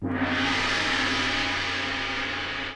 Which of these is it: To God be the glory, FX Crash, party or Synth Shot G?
FX Crash